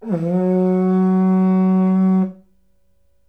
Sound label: TUSK, IVORY HORN; AKAI S6000 CD-ROM; WorldSounds